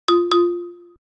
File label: hotwire fail; hijack